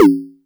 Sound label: Tom; Tom FX; TRX